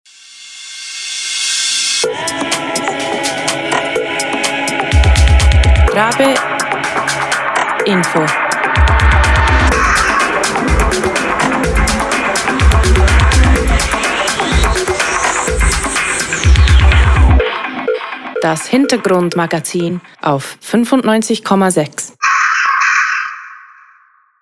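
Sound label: Info Intro Jingle; Rabe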